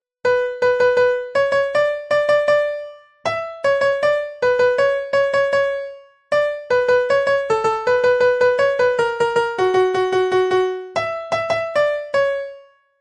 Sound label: piano instrumental